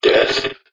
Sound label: death